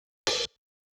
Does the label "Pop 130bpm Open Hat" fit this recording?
Yes